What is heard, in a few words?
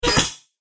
Broken Droid Bits, Robot; Robot Sound Effects